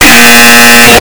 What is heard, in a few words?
incorrect buzzer